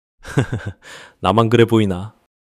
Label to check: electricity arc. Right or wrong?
Wrong